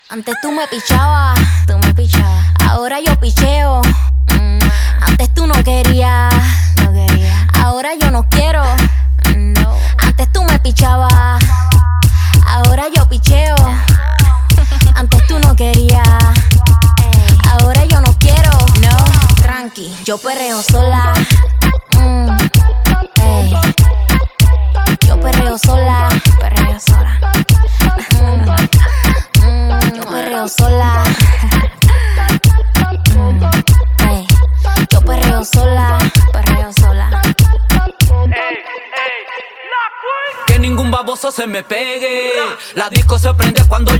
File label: REGGAETON